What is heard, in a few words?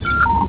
INTERCOM